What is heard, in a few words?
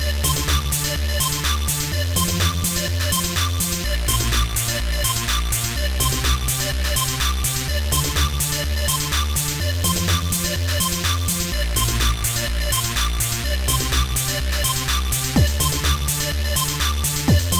instrumental; Trackers; Modland